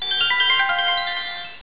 Ring; Doro; PhoneEasy-337ip

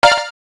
digital sharp; SKY IM-A710K-VEGA-Xpress; keytone

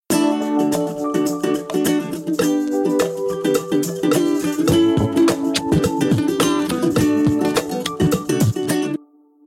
Sunshine, ringtones; WindCanada

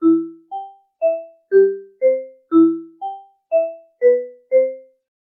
Class Harp

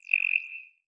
Cricket, notifications; Android; Google; Android Open Source Project

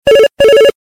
Simple Beep

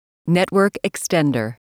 Network Extender; LG; G-Vista-VS880; eri

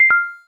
cam stop; LG; F520L-AKA

